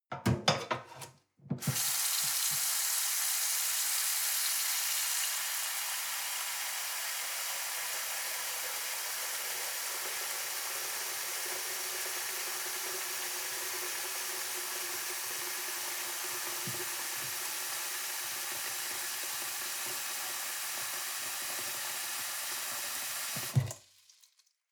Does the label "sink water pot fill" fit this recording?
Yes